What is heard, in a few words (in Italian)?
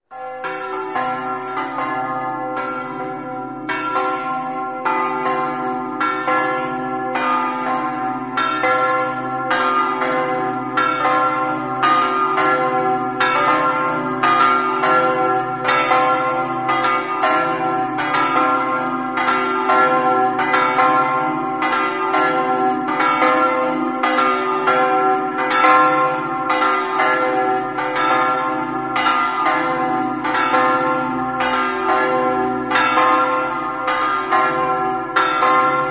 maiano, campane